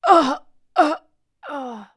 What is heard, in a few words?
death; Alien Arena; cybergirl